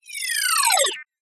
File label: science fiction robotic cyberpunk electronic equipment beep power; zapsplat